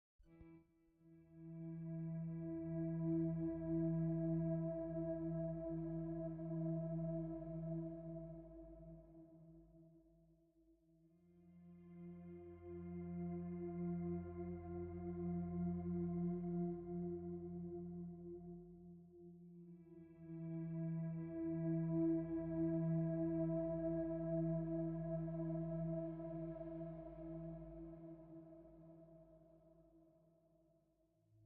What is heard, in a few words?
No Bass Sequence